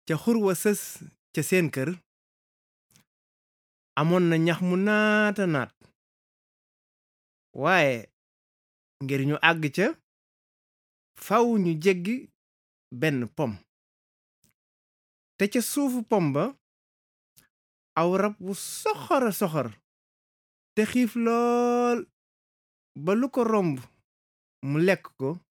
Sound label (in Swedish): bip; wolof